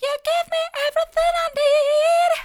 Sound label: you give me everything i need soft, vocal